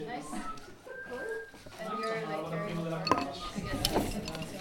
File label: whistle